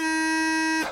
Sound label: key